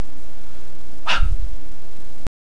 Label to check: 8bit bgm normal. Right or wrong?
Wrong